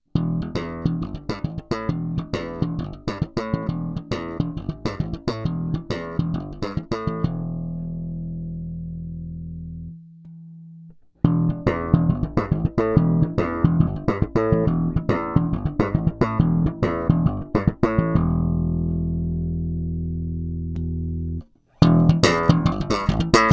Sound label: L1500 Slap